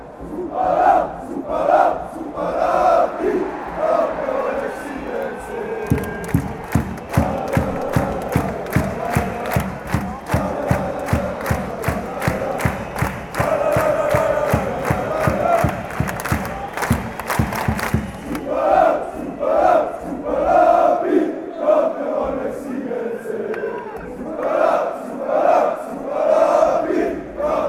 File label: super rapid super rapid, chants